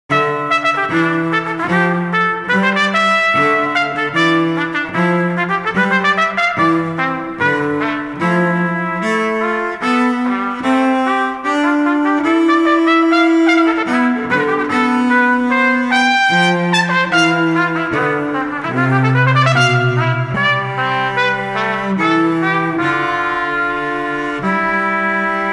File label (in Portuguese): Pequeno Duo Para Trompete e Violoncelo